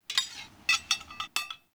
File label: Tent Fire Pot Metal Creak; Soul Plane